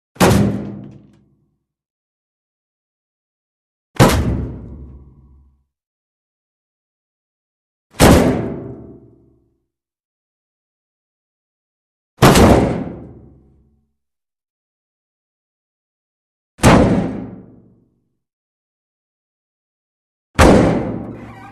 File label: Heavy Body Falls On Metal Heavy Body Impact On Metal; Hollywood Edge Foley Sound Library